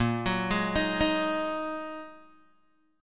Bb 7th Suspended 4th Sharp 5th Bb down Guitar Open G